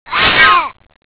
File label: houndeye attack; xash3d fwgs